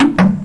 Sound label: tile dropped